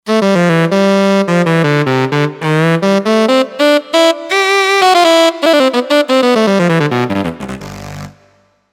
Tenor Sax

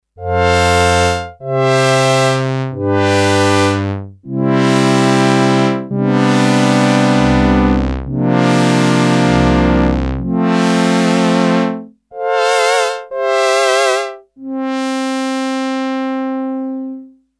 Slow attack brass, Sequential Circuits Six-Trak; Factory Programs

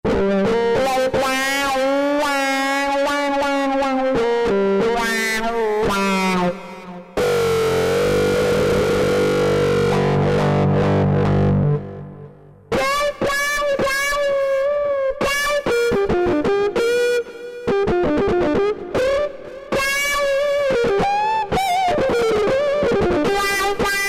Monster Wah, Korg N364; Factory Prog demos

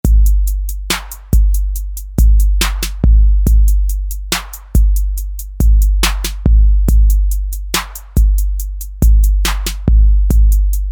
Rhythm, Roland TR-808; Factory

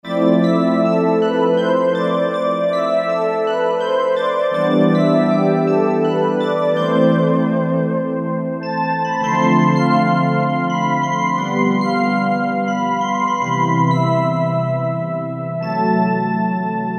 Fragments, Korg N364; Factory Prog demos

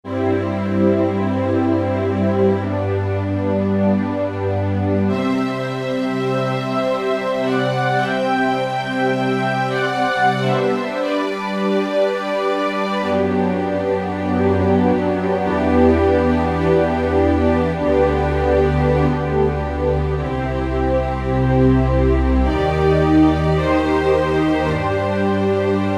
FAT STRINGS, Roland SC-880; Factory Patches

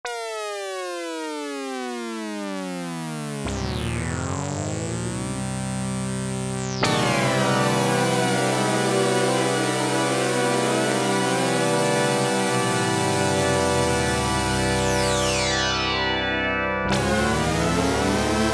POLYPHONIC PORTAMENTO, Oberheim OB-8; Factory Patches

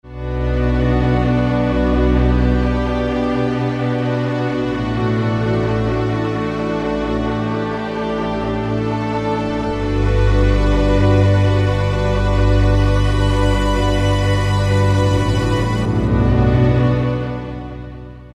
Warm Strings Pad, Roland PN-D50; Roland PN-D50-03

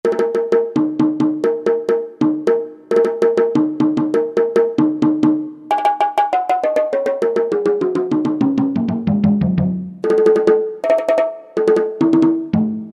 BONGO; Roland SN-U110-02

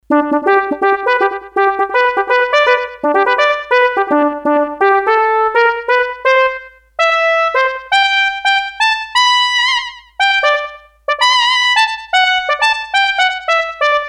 Trumpet, Korg DS-8; Factory Patches

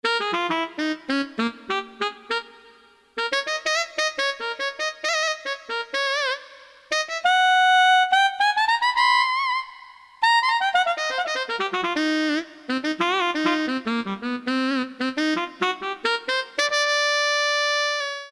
ALTO SAX, Korg DSS-1

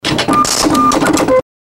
Office Sound Effect; Apple GarageBand 2; Apple Loops

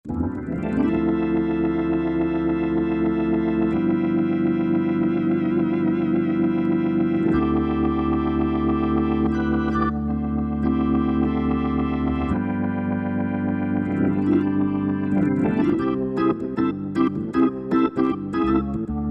3D ROTARY, Roland SC-880; Factory Patches

